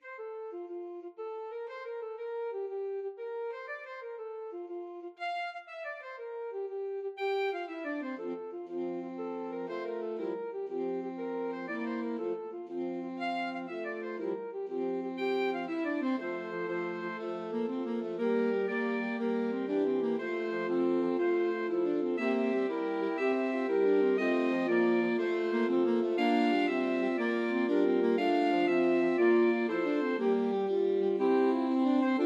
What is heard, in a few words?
fantasia alto sax quartet